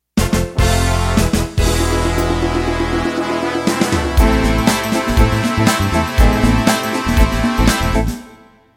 Motown Intro

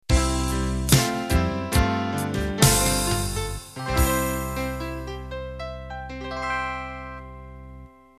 Cavern Pop End

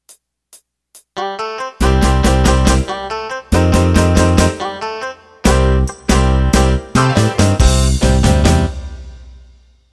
Rock Boogie Intro

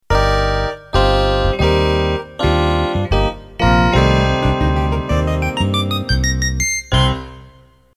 Ballroom Swing End

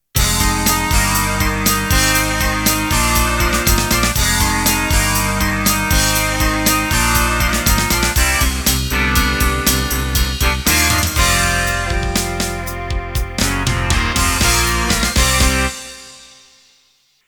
Heavy Rock End

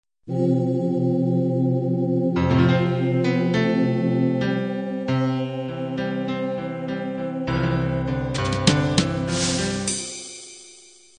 country-pop ballad intro

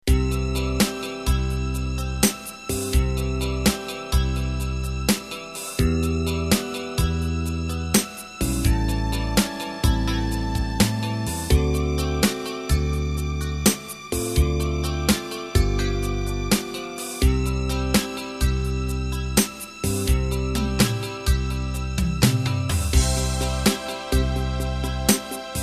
Slow Rock; Rhodes, Clean Guitar and String Section - works for hundreds of modern pop/rock/c&w 6/8 and 12/8 Ballads; Slow Rock 2X (12/8); Slow Rock 2Y (12/8)

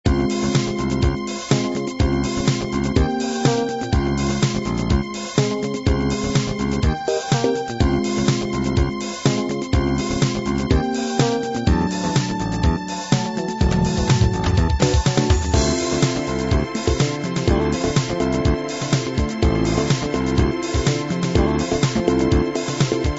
disco miami

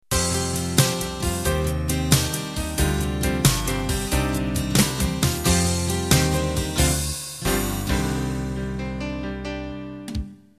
12-8 Ballad End

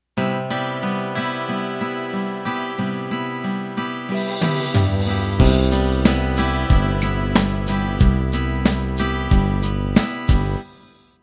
Country Ballad Intro

